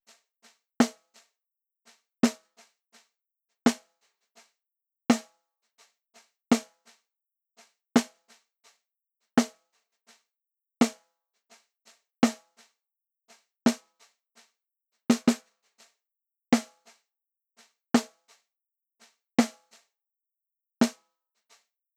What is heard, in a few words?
Drums Snare